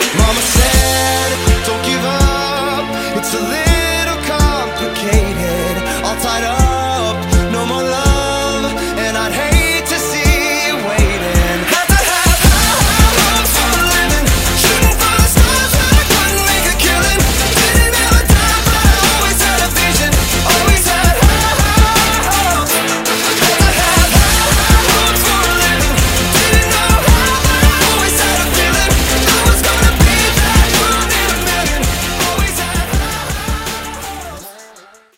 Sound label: 164bpm Dm REDRUM Slam End